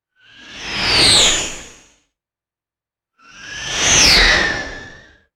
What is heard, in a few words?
fast airy tubular swooshes passing by